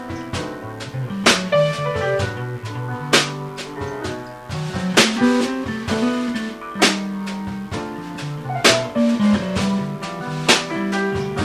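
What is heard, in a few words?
Fender P Bass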